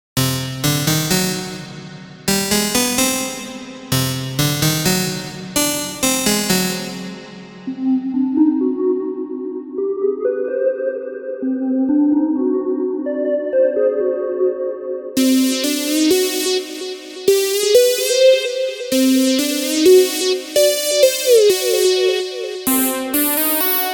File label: leads